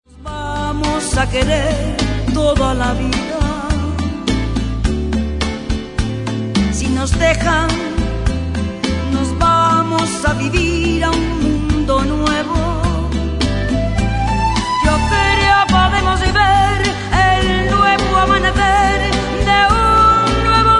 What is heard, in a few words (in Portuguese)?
sinos